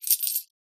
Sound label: split coins